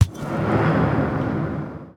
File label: spinbutton; netent